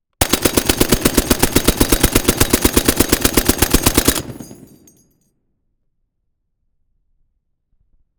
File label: STREET BROWNING M2 BURST 0M FRONT ON GUN; DPA4062; AUDIOBEAST; FIBUA; WEAPONS RECORDING PRIMER